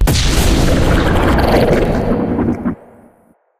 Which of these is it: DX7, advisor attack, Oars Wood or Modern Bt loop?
advisor attack